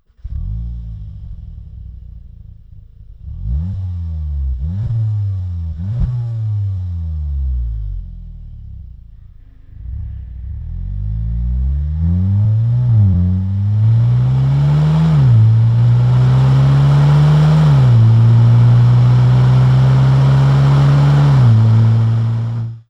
Skoda Octavia III VRS 245 EG OPF-Back, Aerotechnik; REMUS